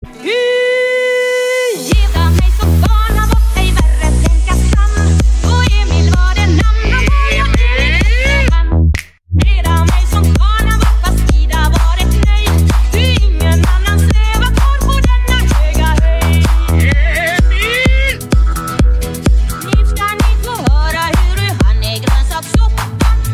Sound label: trap remix